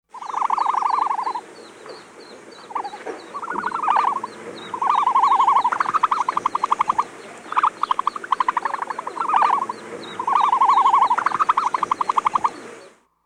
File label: Ostrich, Bird Calls